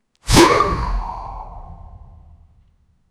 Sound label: heavy blunt weapon swung